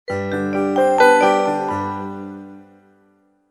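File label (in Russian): melodia vstupleniya, intro melody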